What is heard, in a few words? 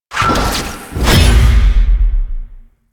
Teleport Cast; Enemies; Warframe; Pax Duviricus